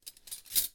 Cutlery, Fumble, Medium; benlustedaudio; Kitchen Cutlery

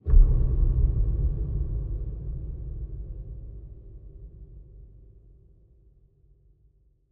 Hit Deep Thud; Baxter Audio; IMPACT

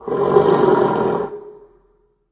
peek look, antlion guard far; hl2

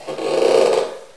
creak; hl2

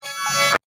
UI Zooms; CB Sounddesign; Activation 2

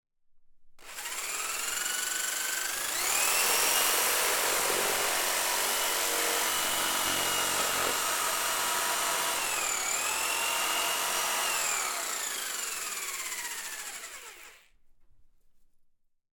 INT Skil saw on carcass, Deer; Coll Anderson

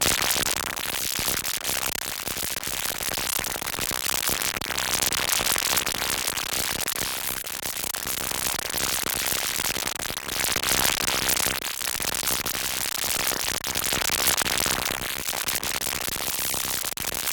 Synth Source Loop, High Voltage; Rescopic Sound

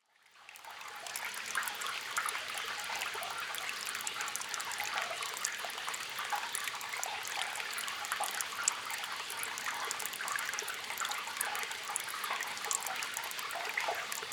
Water Tunnel; Kevin Durr; Essential Water Sounds Vol. 2

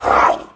ping, turret floor; hl2

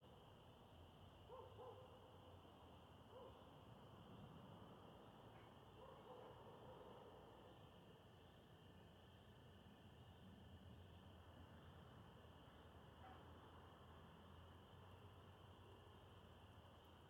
EXT Surburbia Dusk Crickets Dog Traffic Deep; AMBISONIC A; Coll Anderson; Small Town Surburbia

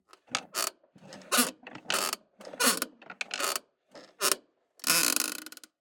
Office Chair Creak; SoundBits; Unsettling Creaks and Squeaks

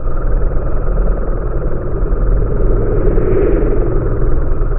hole amb, ambient; hl2

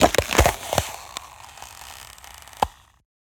ice crack break; Ice Cracking; BluezoneCorp

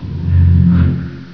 wall bit, ambient; hl2; kraken

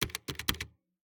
Keyboard Space Bar, Electric Typewriters; SoundBits

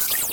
Atraction, Impulse; Navi Retlav Studio; Impulse Fractalism

